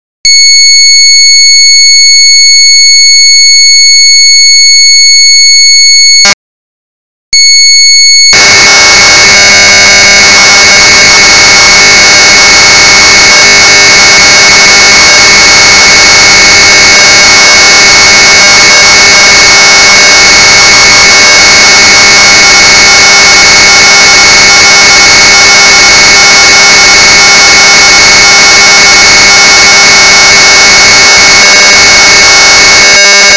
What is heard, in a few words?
2400 baud; caslink2; putup bas